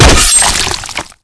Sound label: zombie knife hit; Reega kz